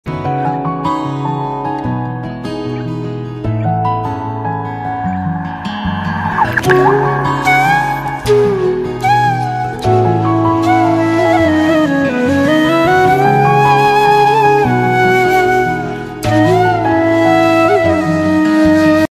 jesus ringtone tamil christian bgm tamil christian ringtone jesus music